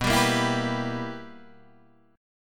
B Major 7th Suspended 4th Sharp 5th Guitar Standard, chord; BM7sus4#5; strummed; 7,10,8,9,8,7